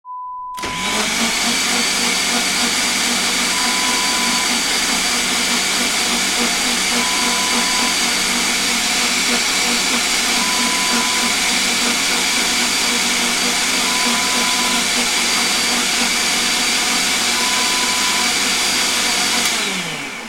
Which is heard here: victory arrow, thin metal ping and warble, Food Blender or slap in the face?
Food Blender